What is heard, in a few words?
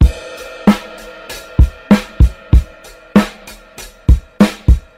Drum loops Boombap